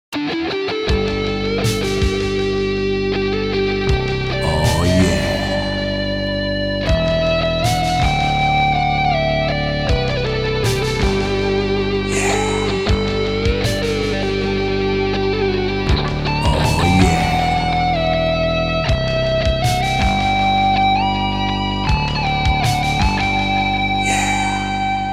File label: Beach Jams